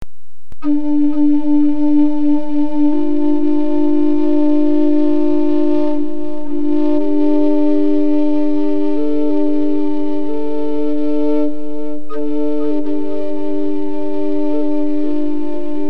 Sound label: drone With Echo